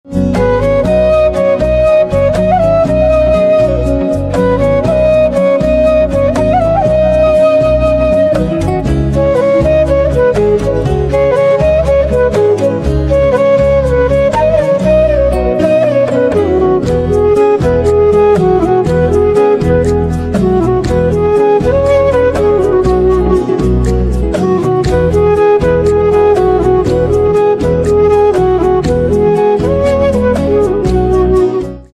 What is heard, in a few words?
Flute